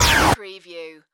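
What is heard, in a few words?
Production Element Hit Distort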